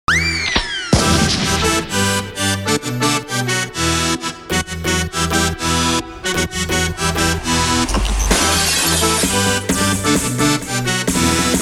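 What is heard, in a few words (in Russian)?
comedy remix ringtone with humor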